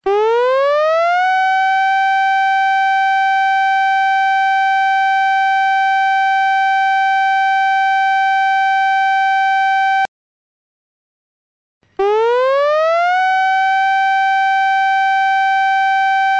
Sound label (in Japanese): tsunami chuihou sairen; 津波注意報サイレン音; デジタル防災行政無線